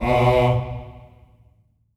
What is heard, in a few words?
Staccato F B2 L, Symphony of Voices; Spectrasonics